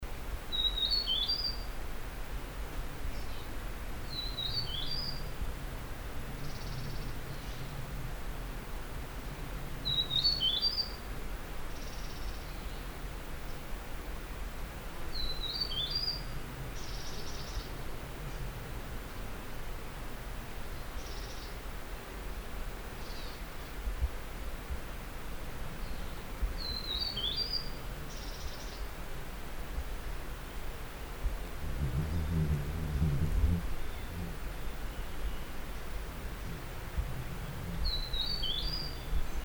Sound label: Huets Fulvetta Song, Fujian Dai Yun Shan; alcippe de huet alcippe hueti